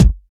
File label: mid heavy kick single shot g key, Royality free kick drum single hit tuned to the G note